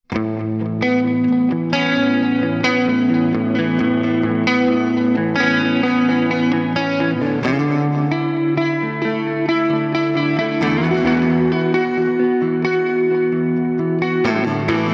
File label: Mesa Boogie Clean Cave; Neural DSP